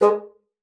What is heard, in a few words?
bassoon, G#3; Xsample VOL-6